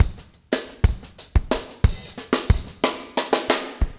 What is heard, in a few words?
motown 120, loops 120bpm